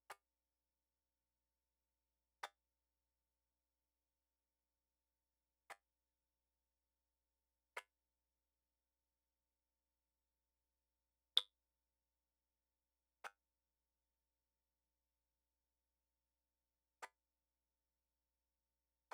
Creek, Garden

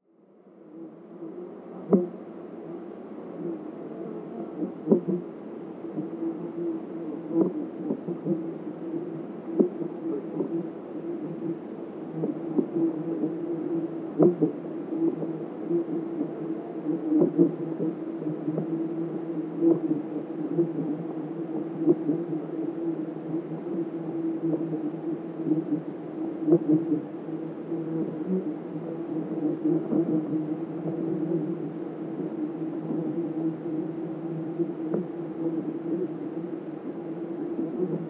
Flies; Garden

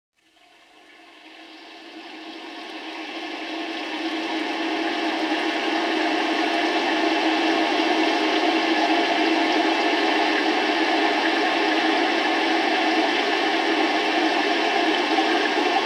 Trickle Water Stream; Chalice Well

